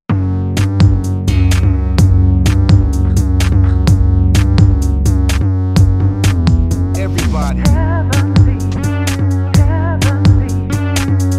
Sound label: DROP THE BASS